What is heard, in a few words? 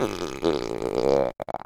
growling